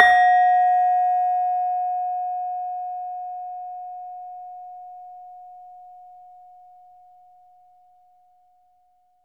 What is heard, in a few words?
LAMEL F4, Asian Traditions; USB Soundscan vol.60; AKAI; LAMELOFO